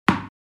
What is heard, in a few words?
Tennis ball hits the ground